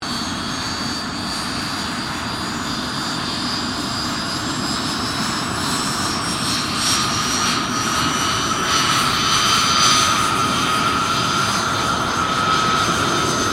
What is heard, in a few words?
1959 JET PROVOST T2 TAXI; BAC Jet Provost; Airplane Sound Effects; Airplanes (Sound Effects:Vehicles)